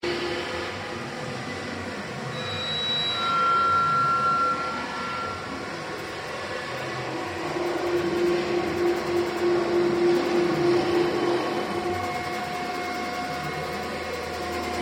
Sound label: Rainforest IV - Binaural Recording; David Tudor; Apo33; London